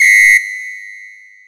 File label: Whistle Long, Percussion; realdrum